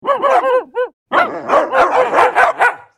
Dogs barking and whining

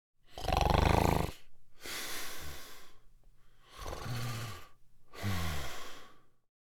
Loud snoring man sleeping; snoring sound effects; gfxsounds

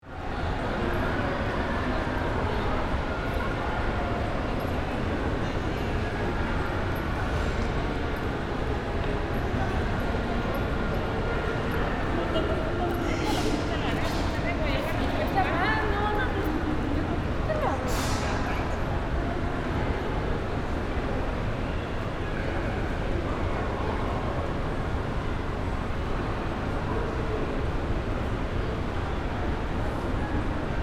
Shopping mall ambience crowd activity Manila

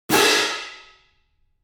Brief cymbal crash; gfxsounds

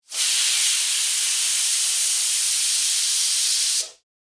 Cooking steam release hiss burst

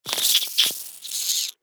Insect little monster call

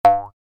Cartoon boing

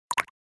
Message pop up

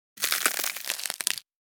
Thin ice cracking

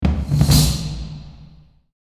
Empire game reward quest complete

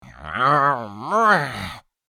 Grunting little fantasy creature; fantasy friends

